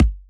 Kicks

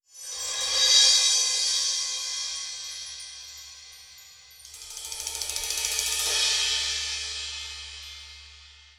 cymbal rolls; Rumbles

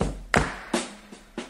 gots da clap, drum loops, boombap

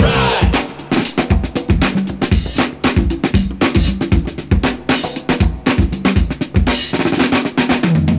in the ride, drum loops

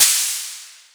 Cymbals, Electro

